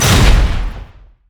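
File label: anchor chain collision; nautilus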